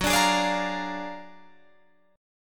F sharp Augmented Major 9th F sharp Guitar Open D

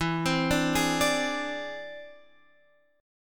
E Augmented 7th E down Guitar Half Step Down; E+7

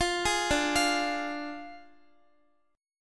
D Diminished D, Guitar; Ddim arpeggiated; x,x,15,13,14,12

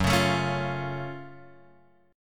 F sharp Minor Major 7th F sharp Guitar Drop D

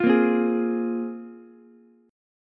A sharp 7th Suspended 2nd, Ukulele chord; strummed; JGuitar

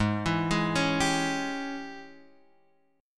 G sharp Major 7th Suspended 4th Sharp 5th, chord

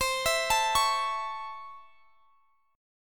A Major C; A/C arpeggiated

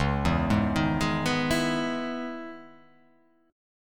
C sharp Minor 9th C sharp, chord

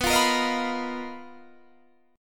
B Major 7th Flat 5th B down Guitar DADGAD

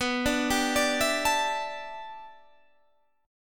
A 7th Suspended 2nd Suspended 4th

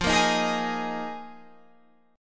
Eb Suspended 2nd Suspended 4th

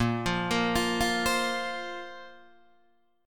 Bb Suspended 2nd Bb down Guitar DADGAD

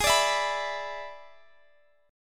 E 7th G sharp, guitar

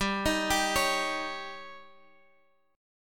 Db Suspended 2nd Flat 5th

